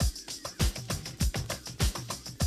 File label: drum loop, 100 BPM